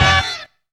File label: OUCH HIT; Zero-G; Funky Elements